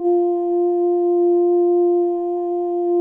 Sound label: MELLOW ST F3, SYN STR